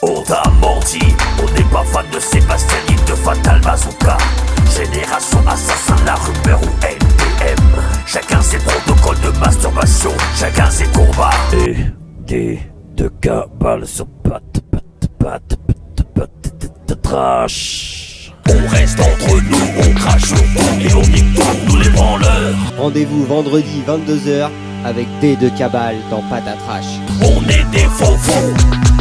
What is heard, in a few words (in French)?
jingle